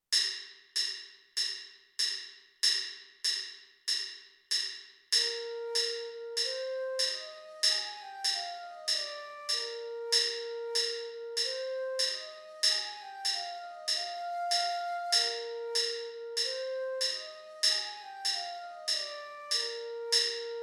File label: sawmill creek flute